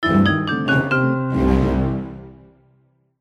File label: Duel Defeat